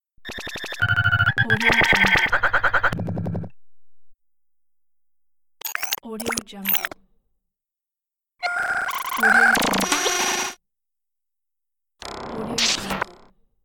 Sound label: cybernetics glitches pack